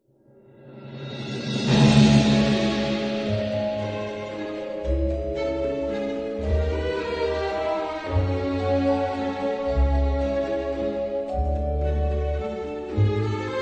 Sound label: Waltz of the Dead No Choir